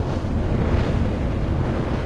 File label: fire Constant; willyshotchillies